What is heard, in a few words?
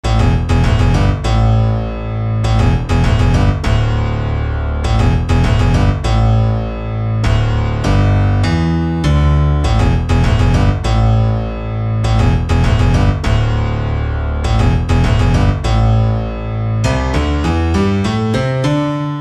piano bass only